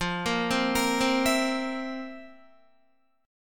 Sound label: F Major 7th Suspended 4th F, Guitar; FM7sus4 arpeggiated; JGuitar